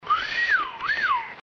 whistle

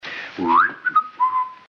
whistle

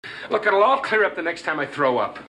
throw up

